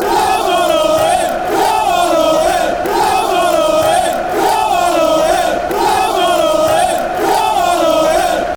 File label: come, chants; orient